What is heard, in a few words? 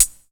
HH HHOP; E-Lab; X Static Goldmine 2; akai